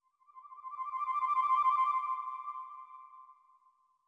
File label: flute trill; Sky Children of the Light gamerip